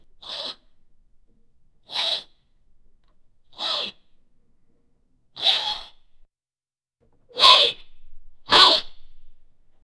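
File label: young girl with very pretty, effort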